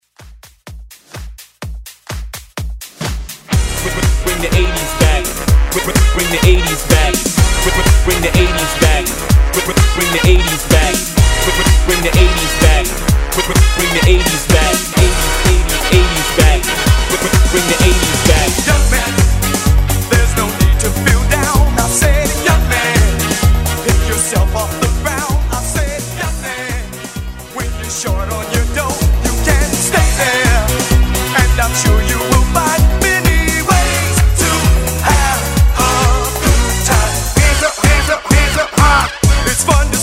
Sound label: 80s Dance Hype Re-drum Intro 126